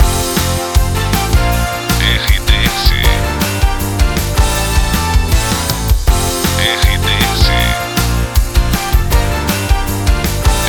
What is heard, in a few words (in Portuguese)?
TRILHA PARA LOCUCAO WEB NET POPULAR